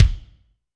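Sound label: Kick Kinetic, Alesis D4; Kik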